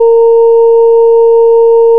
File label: additive voice single; FFTimbre